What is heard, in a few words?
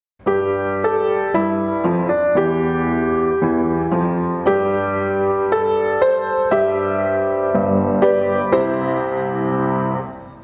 school music with voice